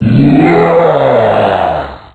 pain; zombie plague

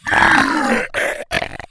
pain; zombie plague

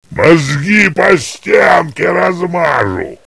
zombie burn

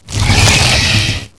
alien pain

